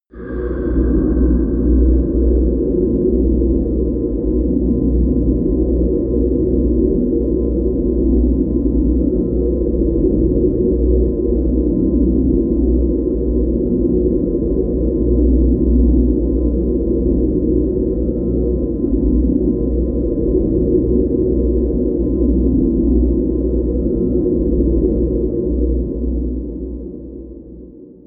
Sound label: drone catacombs